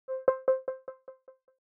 ui button echo resonance high

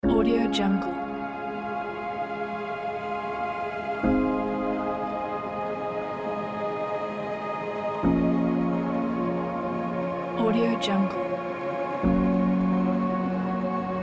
meditation

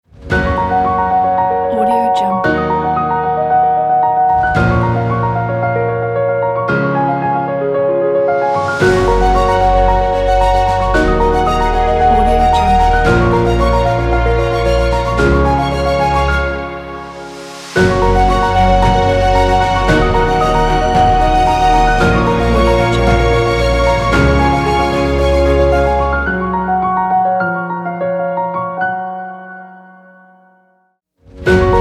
uplifting inspiring ident

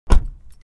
transportation car doors

